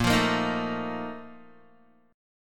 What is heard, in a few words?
Bb Major 7th Suspended 4th, Guitar